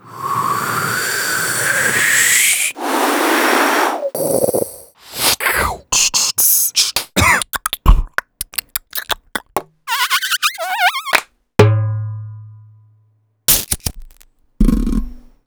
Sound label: Real Recordings